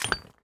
mine stone

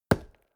lumber tree

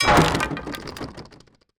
ballista hit siege; ships siege

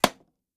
arrow, attack impact

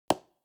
construction wood

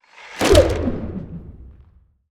warship attack stake; ships siege

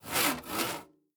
construction saw

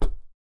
fs wood, singlesteps

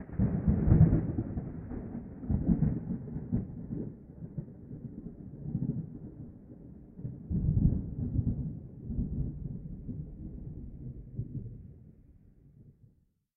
thunder, ambient weather

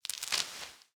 gather fruit

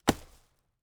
lumber tree

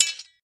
sword hit

